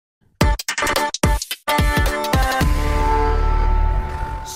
team jingle

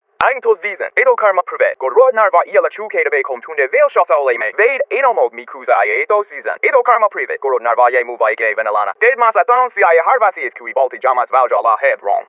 Estonian rap

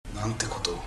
extratone

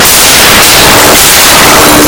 bang shatter

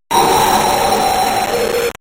jumpscare

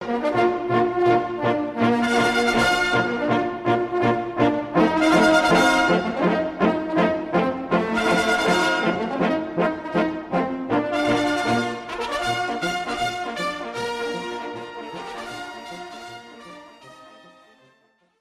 fanfara

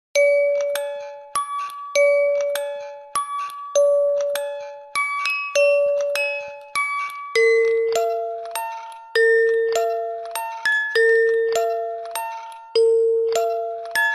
music box; phasmaphobia

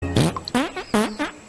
farting; total drama

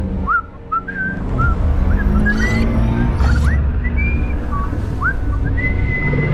robo whistle

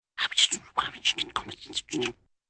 indiscernible whispering

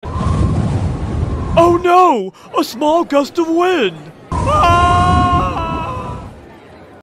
oh no a small gust of wind

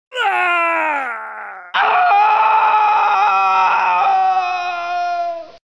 male scream; superkitana and the ult crossovers

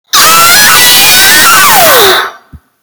ahhhhhjaaaaa

